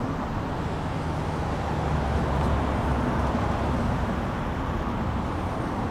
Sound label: noisy city traffic